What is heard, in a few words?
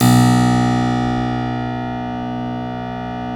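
B 0 clavinet, Keyboards Acoustic; USB Soundscan vol.17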